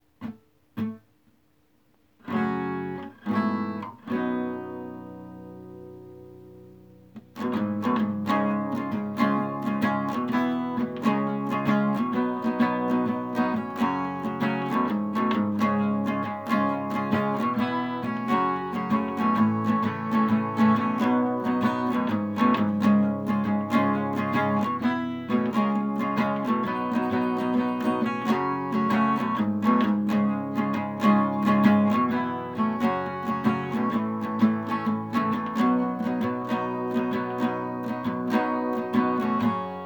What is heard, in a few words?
acoustic; brick and mortar; between us tearoom